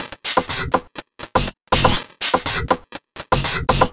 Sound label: 122 Random Phunka Perc, Drum Loops; 122bpm; Nu Dance Electro